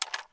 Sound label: pick Pistol